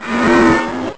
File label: moan